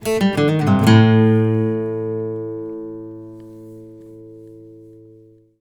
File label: BARI LICK, Blues Guitar; ILIO; Fretworks